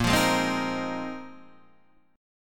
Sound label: Bb 7th Suspended 2nd Suspended 4th Bb, Guitar Open D